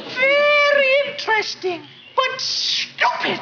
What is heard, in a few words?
Harmony Funky